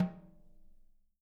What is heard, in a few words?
SN OFF, snares; Sampleheads; Peter Erskine Living Drums